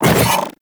Companion Melee Swipe, Pets

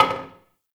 Single Wooden Impact; Warframe; Ambience; Nineteen Ninety Nine

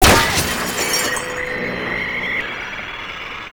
Screen Blast; Warframe; Ambience; NineteenNinetyNine

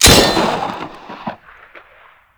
Grineer Pursuit Space Ship Mine Ability; Abilities

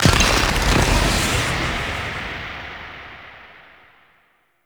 ice barrier destroy; Ambience; Gameplay; Warframe; The War Within

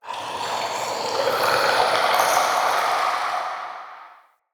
Male Vocal Idle, Enemy; Mockery; Mockeries

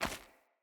Run Stone, Footsteps; Characters; Mother

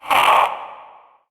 Possessed Red Veil Vocal Pain; Warframe; PriestQuest

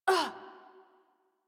Generic Short Pain Operator Voice; Dialog; Warframe; Operator Mission

